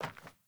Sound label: Sandals Walk Metal, Footsteps; Characters; Player